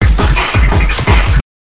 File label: junglist, drums